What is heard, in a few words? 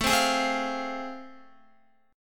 A Minor Major 7th Sharp 5th A, Guitar; AmM7#5 strummed; x,12,12,13,12,13; 4F,4A,5C,5G,5C,6E